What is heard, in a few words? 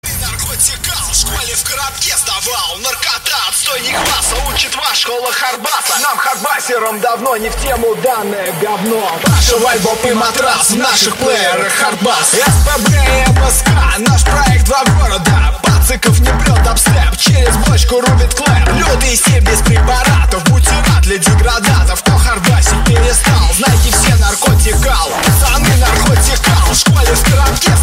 Hard Bass